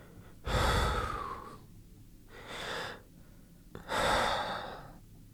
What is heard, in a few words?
Calm Breathing